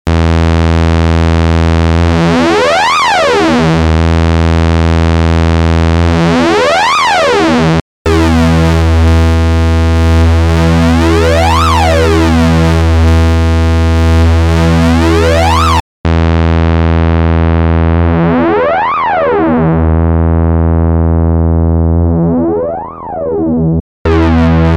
Novation Summit Sync Summit vs Subsequent

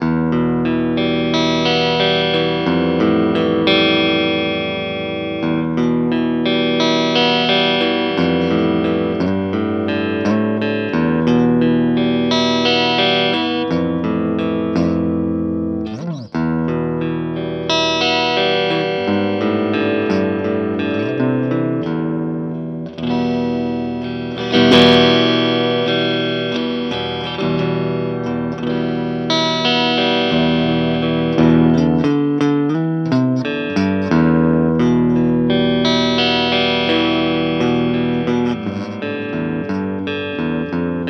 ESP Active Mode Neck Clean; Ben Weinman

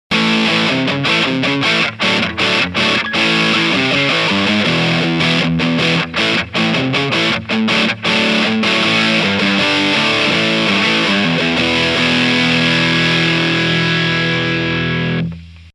boneshaker steg hb gain ful

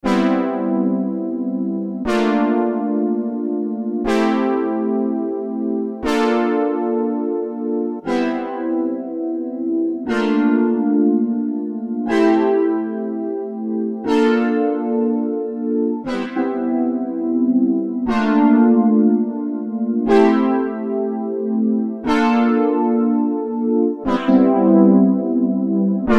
Dual TriChorus Synth

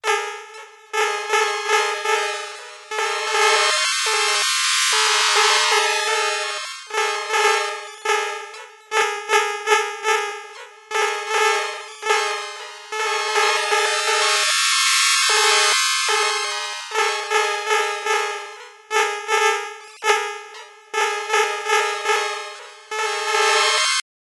Panharmonium Drum Warped and Drum Mode; Rossum Electro Music

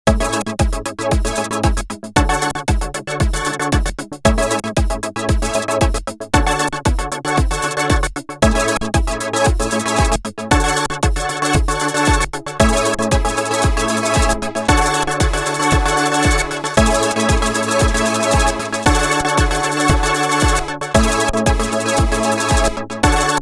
Sequential Prophet X Fun House

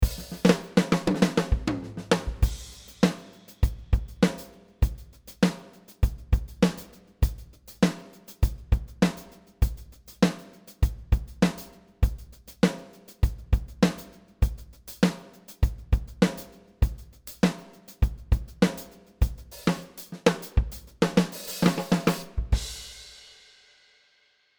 Ayotte Clean Kit; Superior Drummer 3

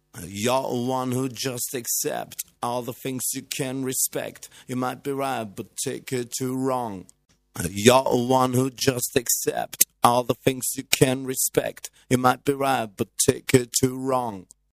Vocals ok

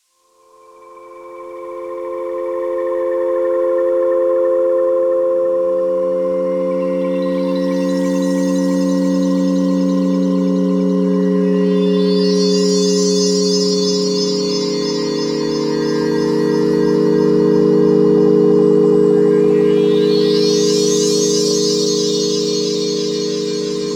JD-800 Model Expansion

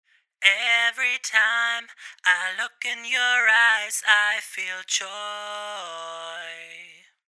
Vocals Adlib

